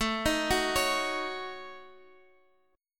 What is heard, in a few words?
A 6th Flat 5th, A6b5 chord, guitar; arpeggiated; JGuitar; x,x,7,8,7,9